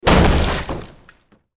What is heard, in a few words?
Small Impact, metal & glass